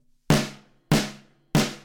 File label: Snare